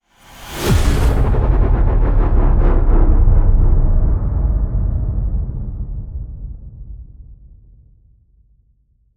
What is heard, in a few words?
Stinger Modulate